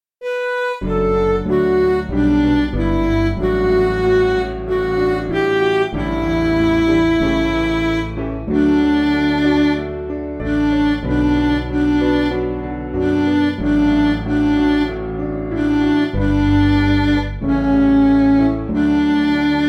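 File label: Music Backing Files for Churches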